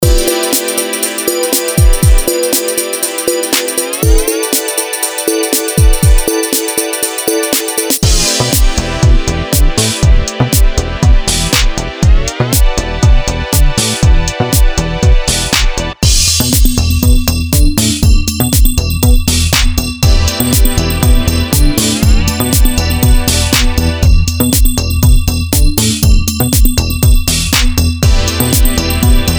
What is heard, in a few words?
beat; bpm:120